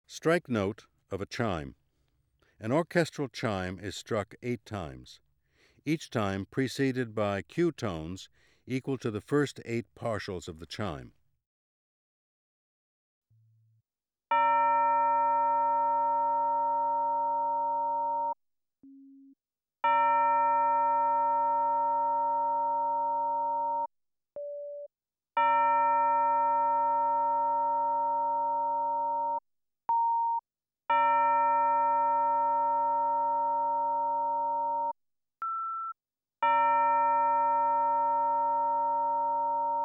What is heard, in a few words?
Strike Note Of A Chime; ASA auditory demonstrations